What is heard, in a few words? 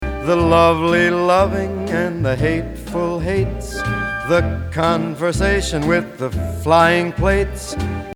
hiccup